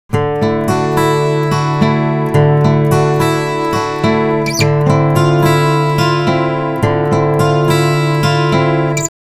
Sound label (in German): Gitarren simultan eingespielt